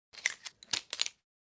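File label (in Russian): вытаскивают карандаш из кучи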